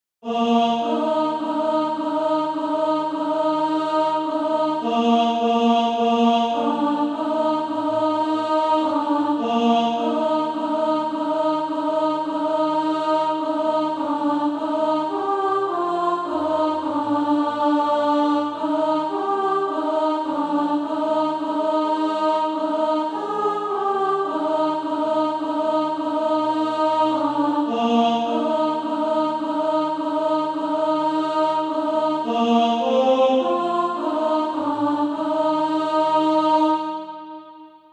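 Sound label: alto, SATB Practise Music; Disciples of All Nations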